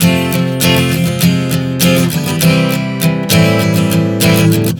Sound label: F-Fm-C-Am 100 bpm, acoustic guitar chord progressions